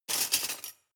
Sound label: cutlery large drawer grab hard